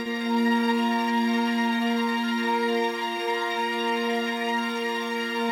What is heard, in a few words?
string sectio loop, 87 bpm